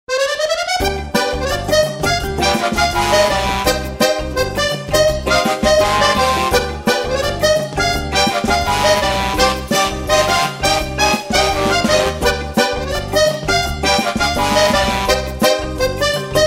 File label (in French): mambo